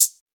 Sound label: raw foot hi-hat sound a sharp key, pedal hi-hat one shot